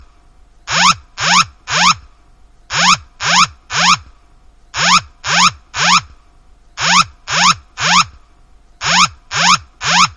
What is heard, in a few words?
Earthquake early warning docomo